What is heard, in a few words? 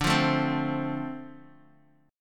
D-Minor 7th D Guitar DADGAD, chord